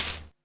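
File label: rain stick, percussion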